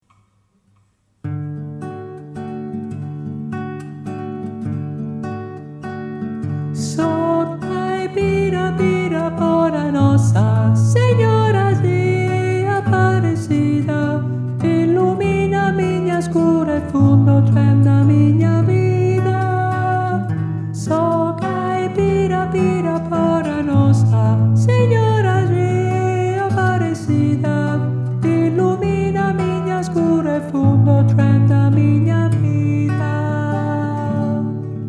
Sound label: soprano